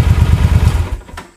suzuki c40 mono mic shutoff, motorcycles; auto

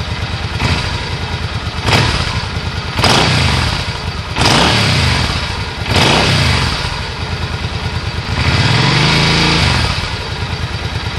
suzuki c40 rev, motorcycles; stereo mic

